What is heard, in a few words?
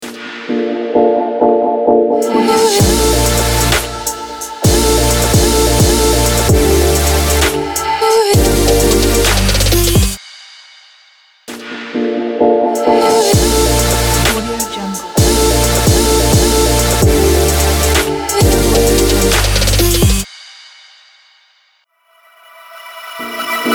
future bass logos